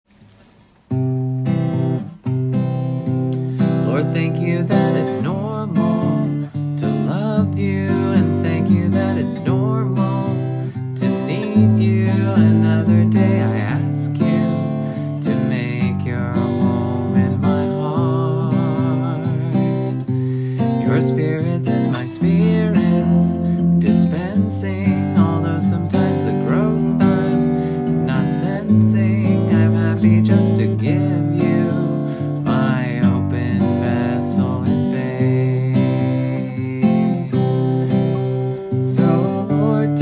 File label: sing, hymn; New Songs